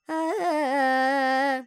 Major, Carnatic Female, Alaps, Sankarabharanam, Dry; Vocals; Sample Banks